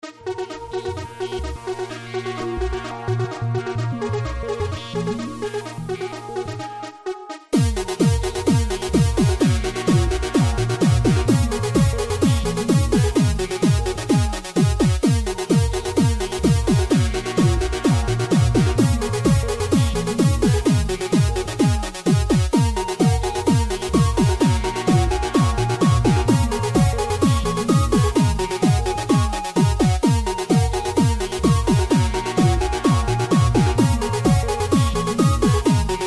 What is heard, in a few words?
Casio synth string version